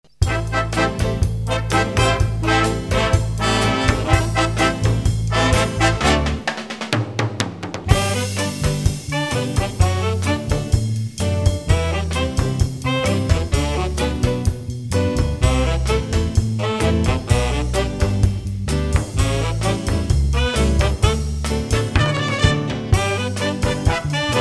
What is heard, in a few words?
Cha cha